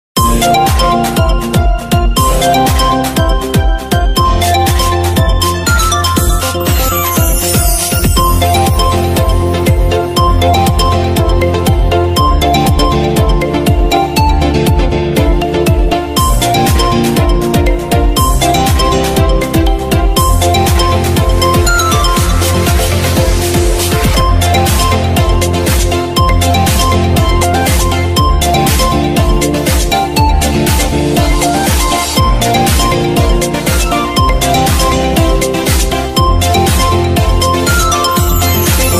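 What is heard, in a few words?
lg default ringtone, g series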